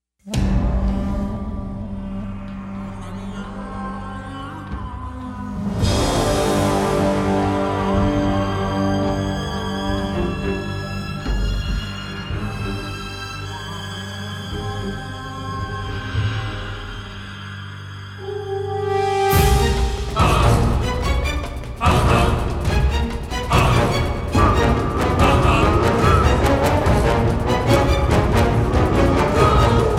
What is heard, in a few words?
Battle Scene; Prince of Persia The Two Thrones; Palace